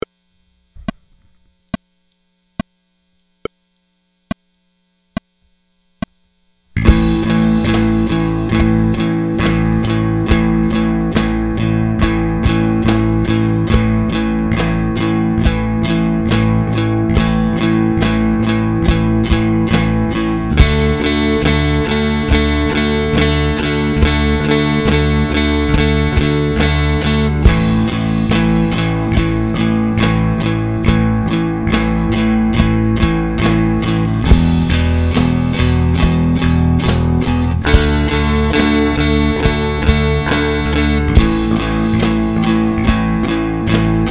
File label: 12 Bar in A Basic Rhythm, Easy 12 Bar Blues Solo